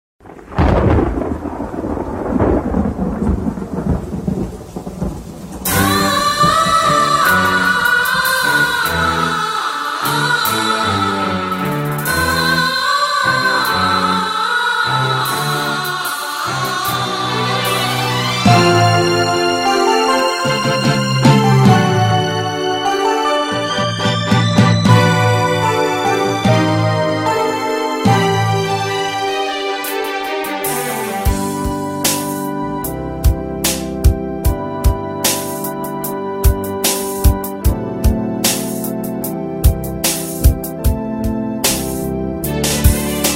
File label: X'an Devotional KARAOKE